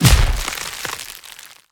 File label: Magic Ice Impact Skill Spell